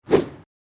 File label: interface slide; perry; perry sightings